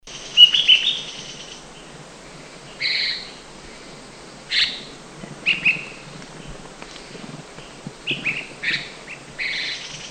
Chestnut Bulbul Ssp Canipennis Call, Guangxi Da Yoa Shan; bulbul marron hemixos castanonotus ssp canipennis